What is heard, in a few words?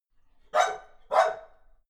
dog reverby bark scared; natur-tiere; hunde